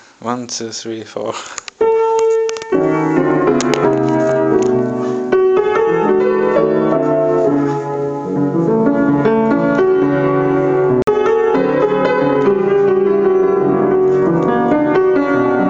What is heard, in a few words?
jazz fantasy; sakura taisen live